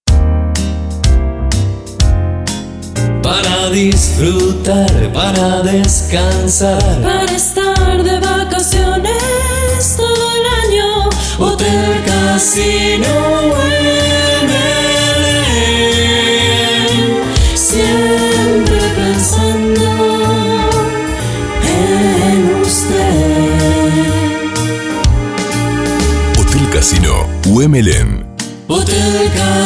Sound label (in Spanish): Jingle Cantado jazz; hotel casino hue melen